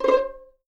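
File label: C5 pizzicato forte left, violins; East West; The Ultimate String Collection; VLNS PIZZ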